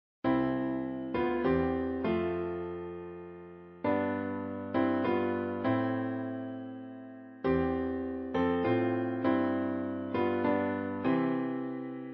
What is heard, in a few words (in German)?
Stille Nacht heilige Nacht Posaune, Posaune und Klavier